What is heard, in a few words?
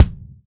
LD Kick; Roland BK-5